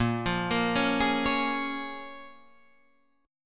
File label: C sharp 6th A sharp